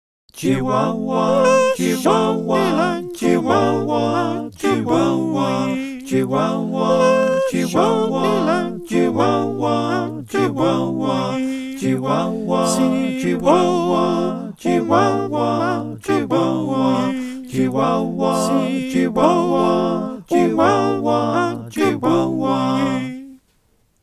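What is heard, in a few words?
soprano alto tenor bass, choir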